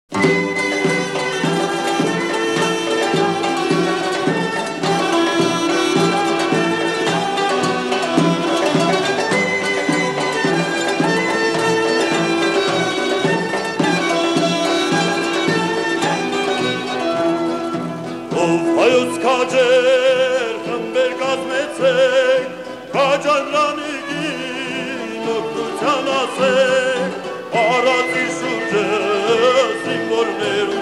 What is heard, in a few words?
Choir; Potorik Choir; Ov Hayotz Kacher; patriotic